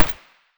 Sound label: scar silencer mono distant